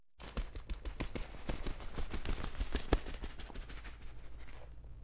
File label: birds up, jungle; AMBIENT; Pirates Of The Caribbean